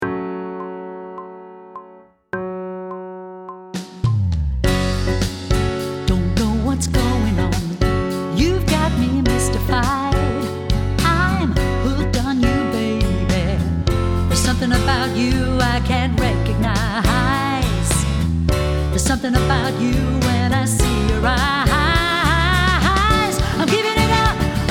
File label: Sight Singing with vocal, pop vocals; Grade 8